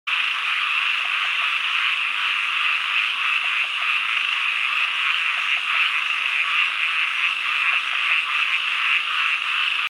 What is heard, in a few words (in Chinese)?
巴氏小雨蛙